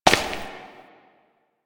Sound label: Step Rock; Warframe; Koumei